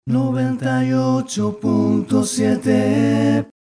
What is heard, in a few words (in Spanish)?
987 jingle dial cantado; 98 punto 7